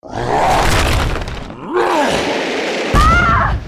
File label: Tank Rock Throw